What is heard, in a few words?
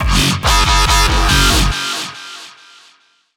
dubstep club bass